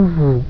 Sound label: Goodie Gone; Super Maze Wars Demo; Arcade